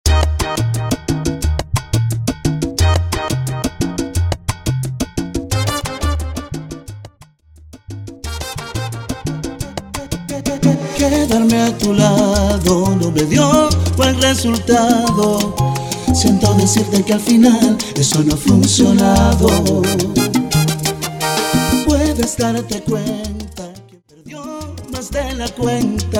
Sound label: Intro Outro 88 BPM